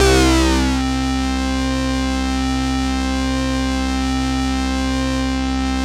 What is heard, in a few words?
SYN ACID; AMG - Now CD-ROM (Roland); Megabass 1